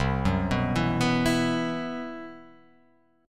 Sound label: C Major 7th Flat 5th C